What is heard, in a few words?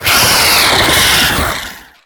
Harpooned; Evolve; Wraith